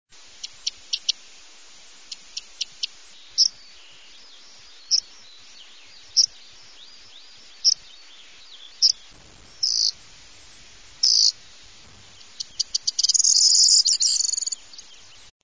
canto triguero; aves campo

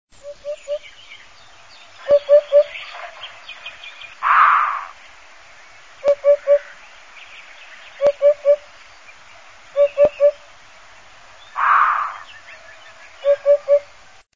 canto abubilla; aves campo

